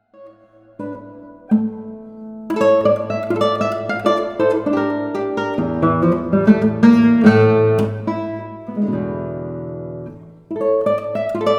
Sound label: tango